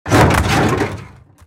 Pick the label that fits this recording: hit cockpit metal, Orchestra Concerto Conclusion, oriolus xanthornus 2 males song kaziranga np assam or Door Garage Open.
hit cockpit metal